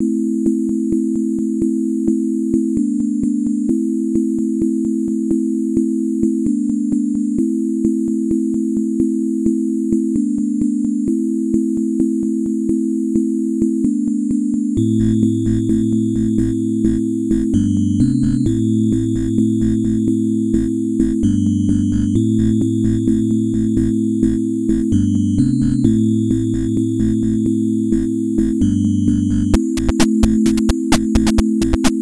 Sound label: The Organ SCC